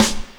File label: SD, Dance Drums